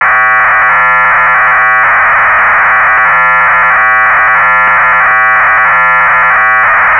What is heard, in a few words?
BPSK USB